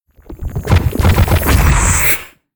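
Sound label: monster spawn; Senillinea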